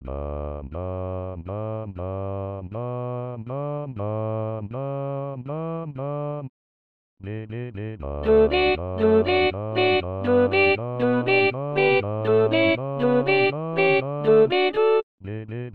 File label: ALTO